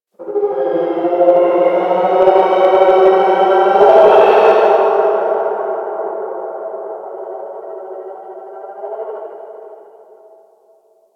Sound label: Ice Creature Distant Cry; Ambience; Warframe; Corpus Ice Planet